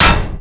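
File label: crowbar hit, weapons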